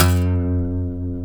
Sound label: G, funk guitars; Spectrasonics; Hans Zimmer Guitars VOL-1; TAKA FUNK